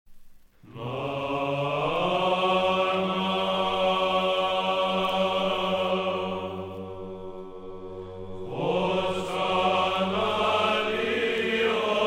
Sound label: Greek, Service of the transfiguration of our saviour